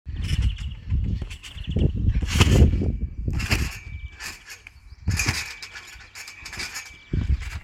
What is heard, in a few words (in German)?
geraeusch